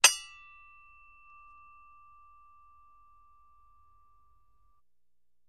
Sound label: Axe Clashes With Ring Outs; Light Axe Clink With Scrape And Light Ring Out. - Metal Scrape